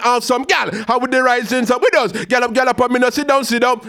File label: ragga in B phrase